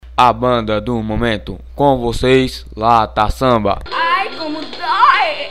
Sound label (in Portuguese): lata samba introducao